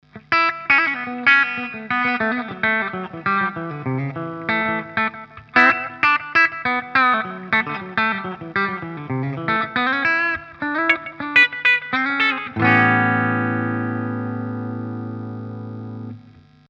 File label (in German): Epiphone Les Paul Custom Steg clean